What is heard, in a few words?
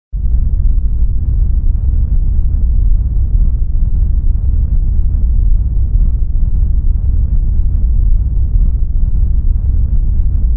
underwater sub sound